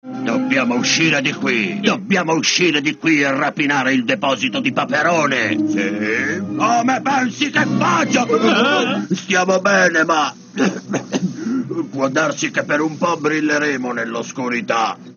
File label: Bass